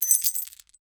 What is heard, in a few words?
equip chainmail; sfx arpg